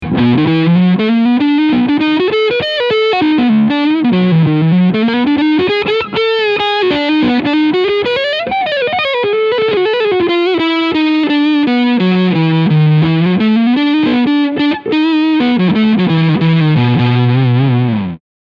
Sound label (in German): Ibanez ART120QA E-Gitarre Crunch Sound beide Pickups